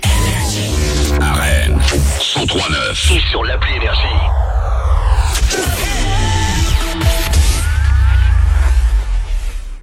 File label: JINGLE; RENNES, 103.9 FM; NRJ